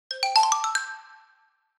xylophone

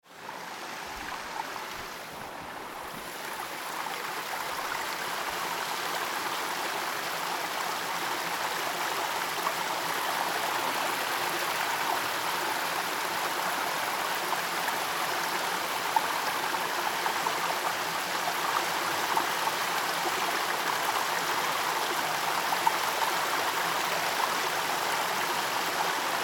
water; Ukiha

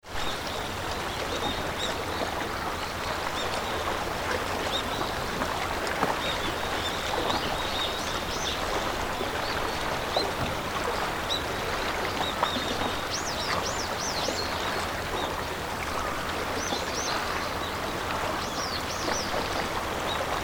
wave on a boat

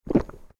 drinking

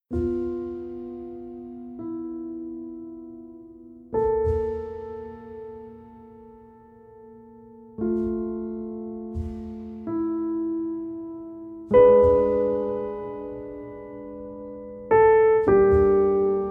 piano

